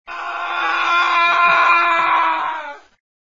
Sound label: Scream Aaagh; ringetoner